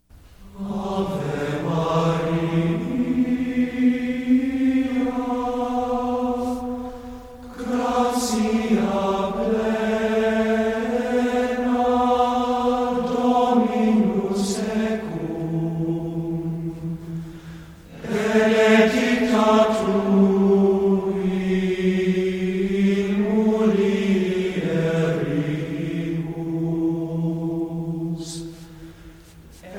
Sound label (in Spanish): Canto gregoriano - Ave Maria; Catholic Music with the Holy Rosary; Catholic chants and songs